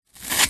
foley long hair cut with scissors single cut; zapsplat

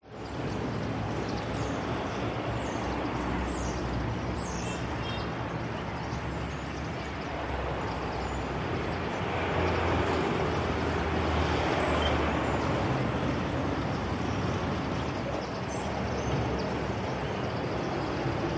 Morocco Traffic; audio hero

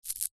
household necklace beaded pick up

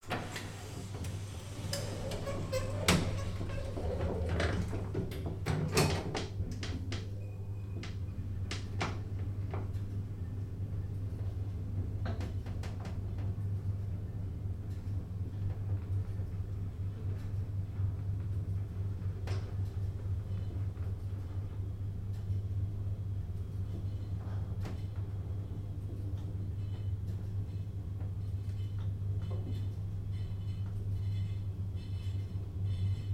industrial machinery elevator ride; sound effects one

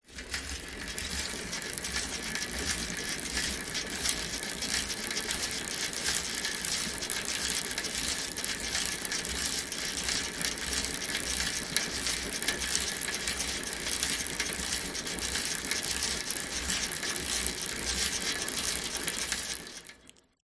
vehicles bicycle pushbike pedaling med speed

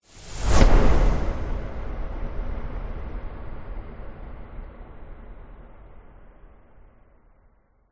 whoosh; orlando lanzini

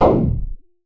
StunGun; martyn stonehouse

